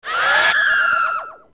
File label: ReDead Scream; zelda; OOT